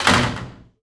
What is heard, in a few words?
jaildoor, percussive